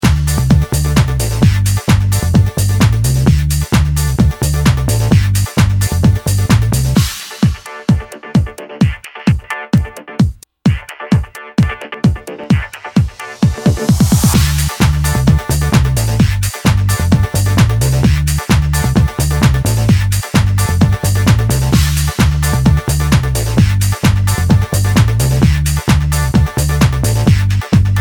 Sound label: main music; RS Games Sounds; Battleship